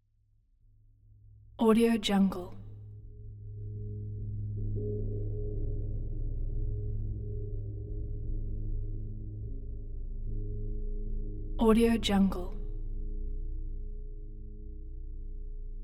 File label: lfe pulsing ring out